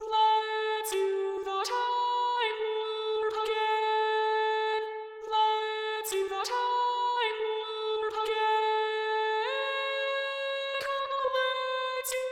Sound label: Tenor